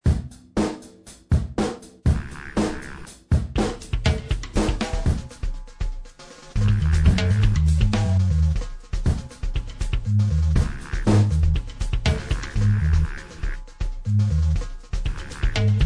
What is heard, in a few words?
drum loop; Newport,OR (April 2005)